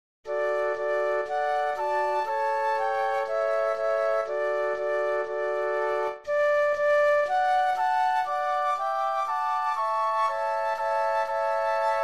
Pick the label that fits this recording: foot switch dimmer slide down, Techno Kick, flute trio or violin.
flute trio